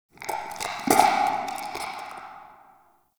Interior Goop Distant; Ambience; Indoor; Warframe; Infested Eris

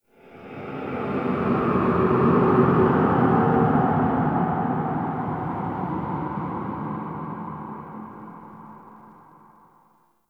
Golden Maw Ambient Texture; Warframe; The War Within

